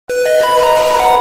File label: Samsung bass boosted reverb